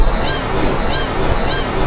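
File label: umineko, black-tailed gull; zukan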